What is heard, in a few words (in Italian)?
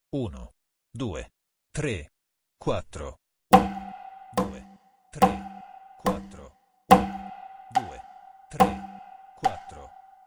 Quaternario; esercizio; Sonora vol A